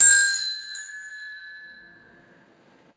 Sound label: single bright metallic service bell ding; bells